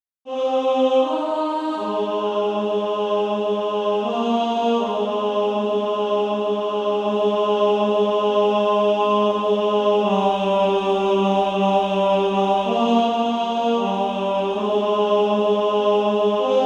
Female Choir; 2nd Alto; SSAA

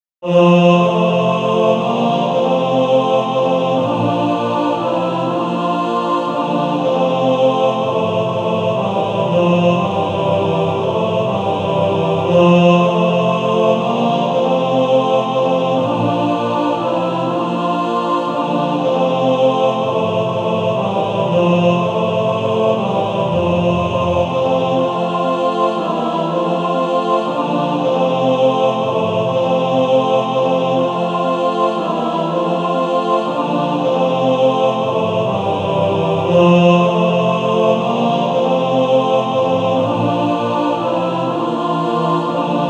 Male Choir TTBB

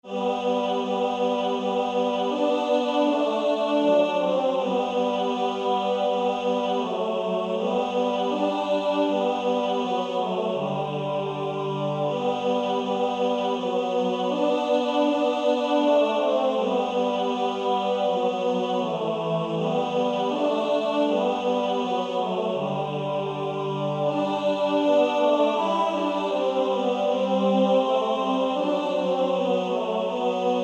Male Choir TB; Angels We have Heard on High

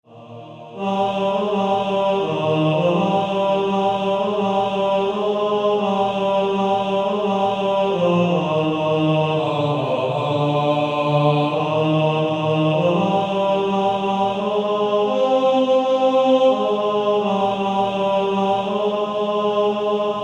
Male Choir T2

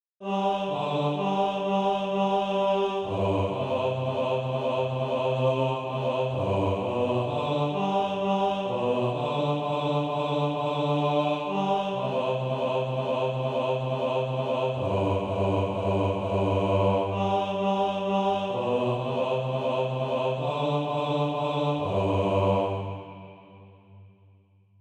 bas

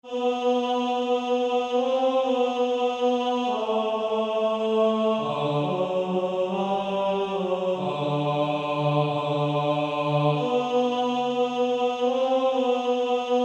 Male Choir

